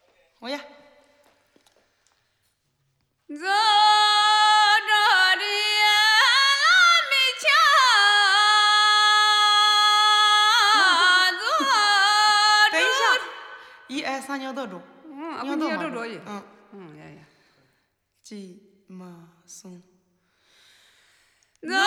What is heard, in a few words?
SOUNDSCAPE un cut un mixed; Sound Hub Studios